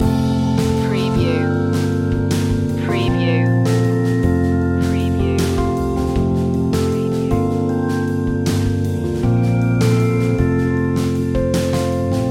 stay positive loop

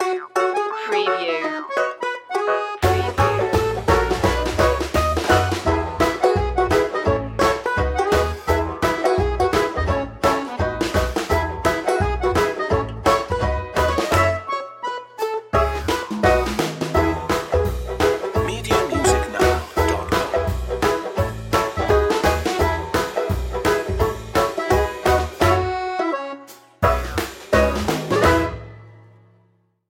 party barn banjo

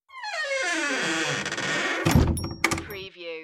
Wooden Door Close With Creak and Bell; Sounds of doors and windows opening and closing, keys locking / unlocking doors

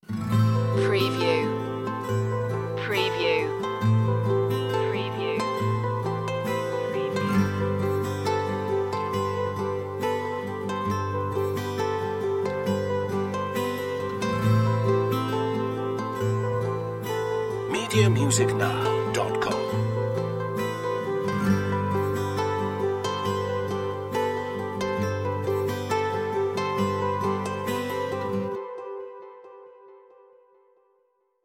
short acoustic version

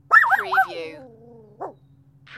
Little Puppy Barking

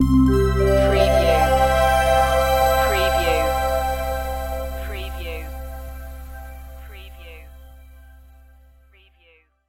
web ident

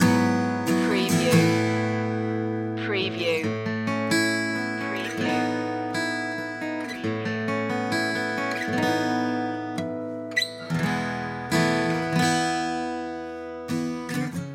soft approach loop

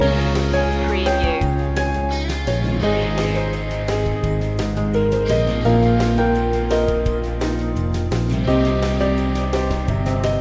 lovely landscapes loop

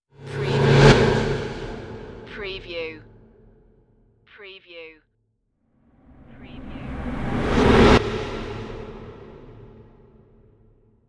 scary whooshes

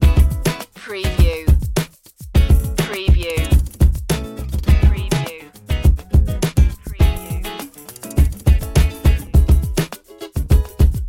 samba hip hop

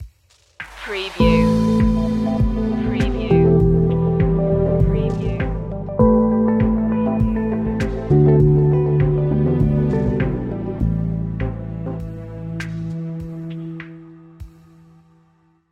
groovy meditation stinger

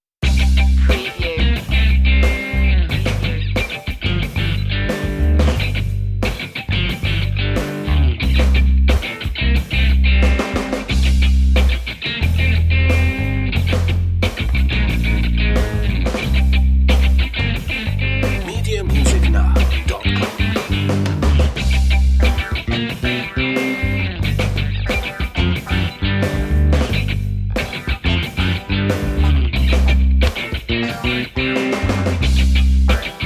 happy birthday funk underscore

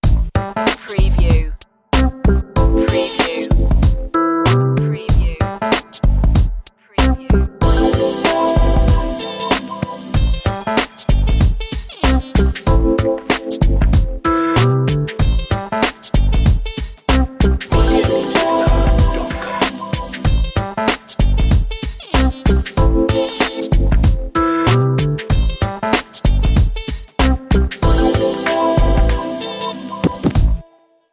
funky summer

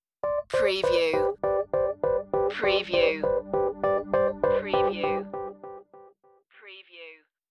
scientific discovery stinger

